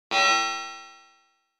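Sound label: Electric Spring Reverb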